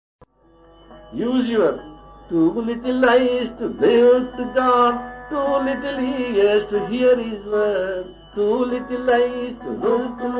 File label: KIDS CHANTS; Swami Sivananda